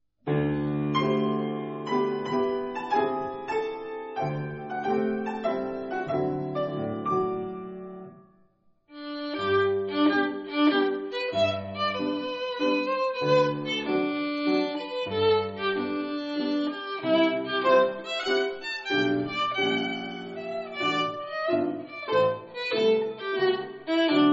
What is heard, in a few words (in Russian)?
cкрипка; Р.Глиэр; Мазурка